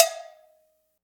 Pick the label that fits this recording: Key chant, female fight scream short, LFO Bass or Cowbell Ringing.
Cowbell Ringing